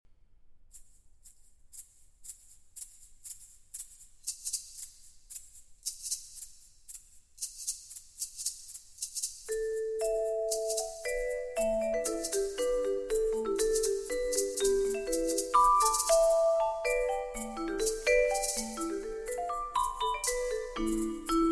Double Concerto For Alto Saxophone, Percussion and Orchestra